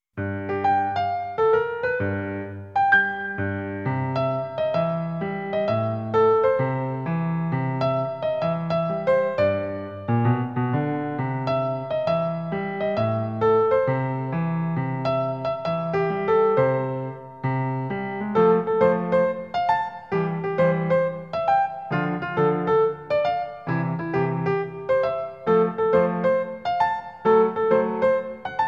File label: Above Ground BGM; NINTENDO